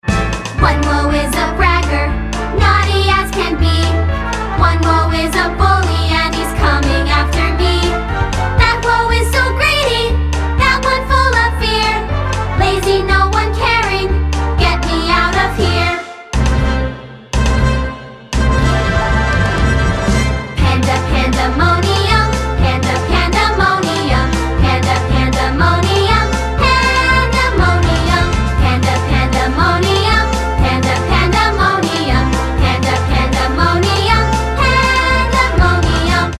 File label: Pandamonium Vocal Sample